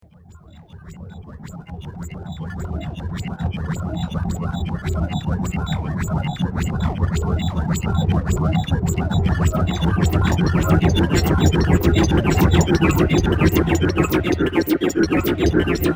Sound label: Alien Ant Farm; Alien Sound Effects; science fiction sound effect; Andrew Fleming